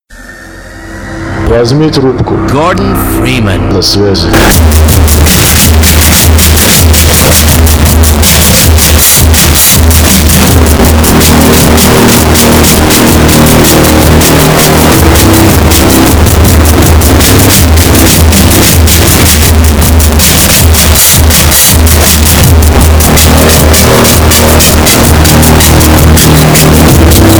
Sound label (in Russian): rington